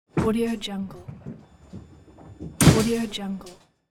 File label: bowling gutter ball